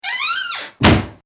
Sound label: Door Wooden Close W squeak